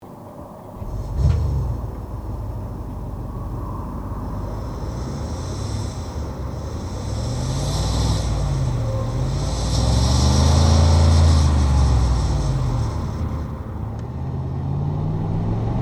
Cadillac Escalade Hybrid

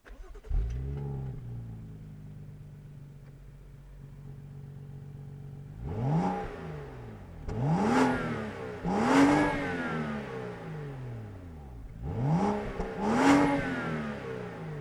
BMW 335i M Sport

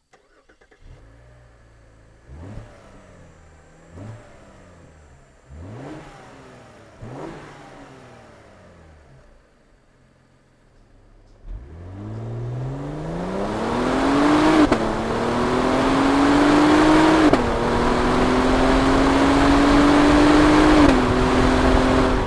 Cadillac CTS Vsport

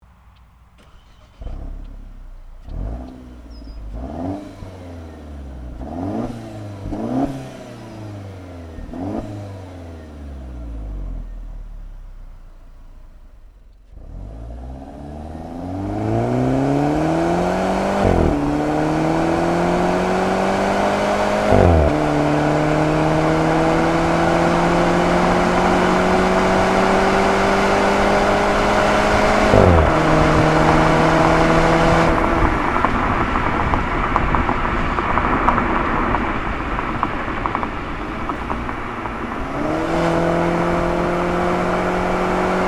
Mercedes Benz C250

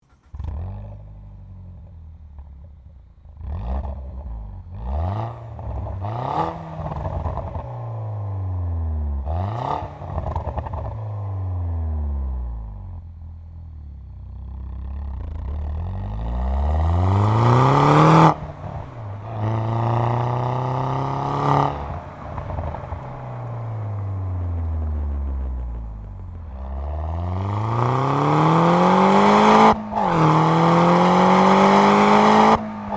Mini Cooper JCW

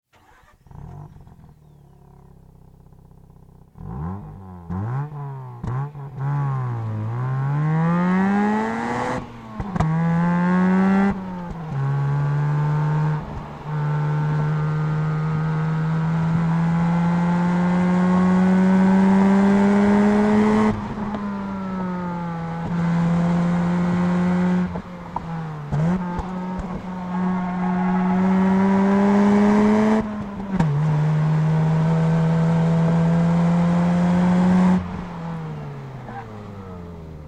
Mazda RX 8

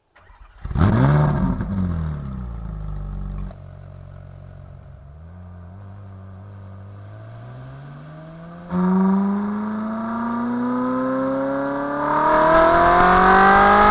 Ferrari 458 Italia

